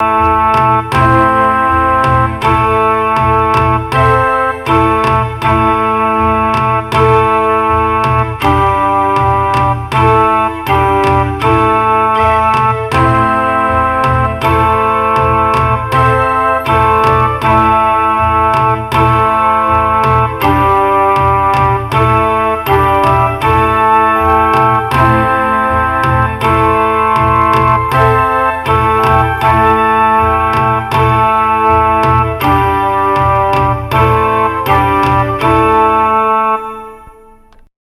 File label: recorder indian